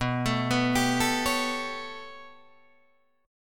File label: B 7th Suspended 2nd Sharp 5th down Guitar Drop D, chord